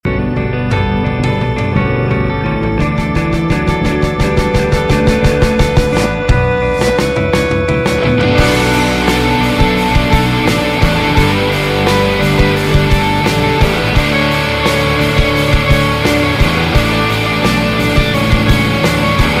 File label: drum cut disagree